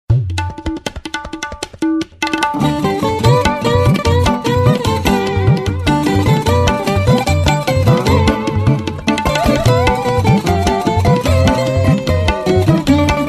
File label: Tabla